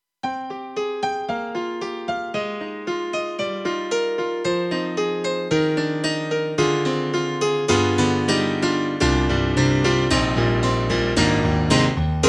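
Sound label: Thin Bright Grand 2, piano; M3 Version 1 Wave; KORG M3 XPanded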